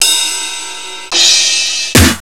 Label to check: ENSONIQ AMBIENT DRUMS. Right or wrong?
Right